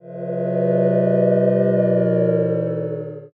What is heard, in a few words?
cheer far